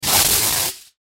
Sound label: Tin Foil Rip Sheet; Ripping; Sound Effects:Objects